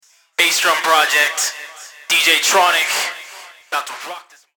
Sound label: Acapellas